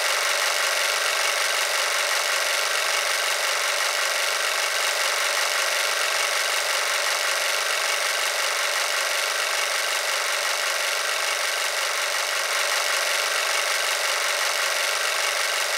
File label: spin hum; gmod; pcasino